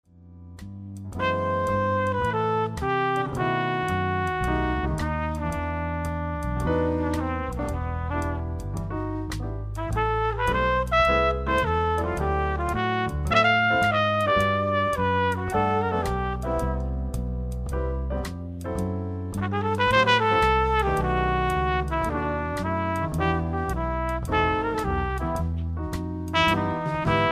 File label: Quartet, jazz band